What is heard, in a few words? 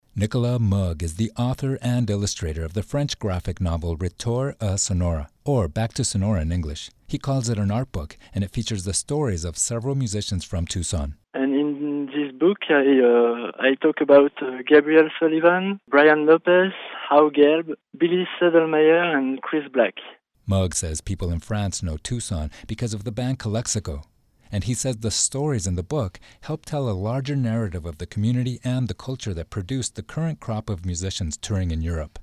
moog